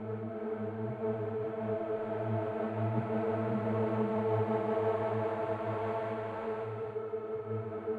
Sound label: Drone; stereo toolkit samples; Non Tempo Loops